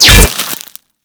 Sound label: Bolt Impact Stone; Warframe; Cross Bolt